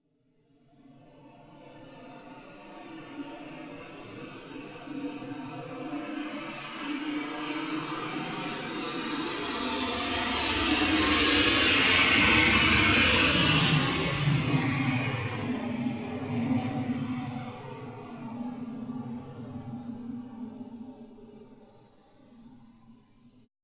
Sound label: moto